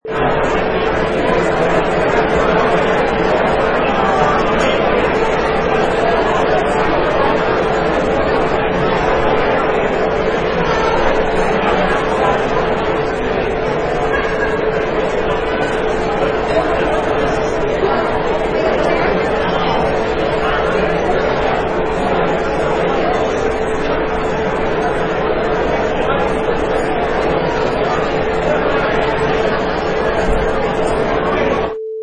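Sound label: Huge Banquet Ambience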